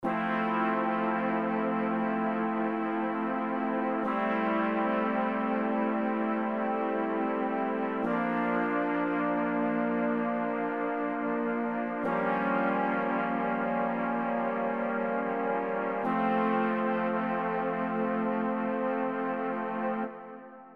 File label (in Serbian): akordi limeni duvaci dur